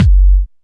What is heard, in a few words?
BD SYN, Korg EMX-1